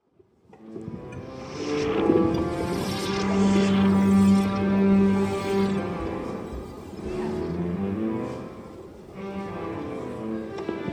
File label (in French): on accorde les violons